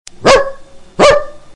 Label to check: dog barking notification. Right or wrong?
Right